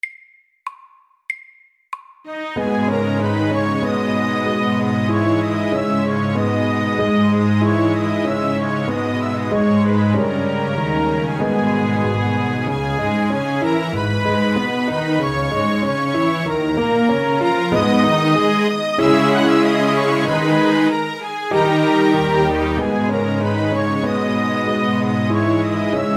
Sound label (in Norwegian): Old French melody MIDDELS